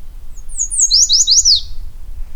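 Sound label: song yellow warbler yellowknife; I was walking down the sidewalk in downtown Yellowknife in June when I heard this song; a sweet "Please-please-please-ta-meetcha"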